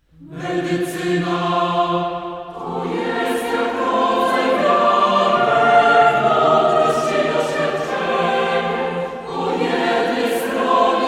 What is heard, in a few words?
acappella; MedycynaHymnWUM